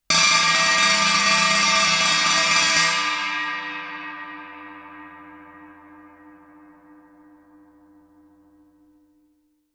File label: chinese stick roll, Suspended Cymbals; Percussion